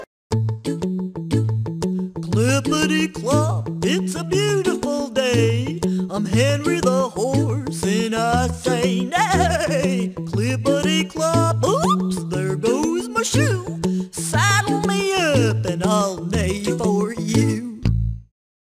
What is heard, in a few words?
Horse Song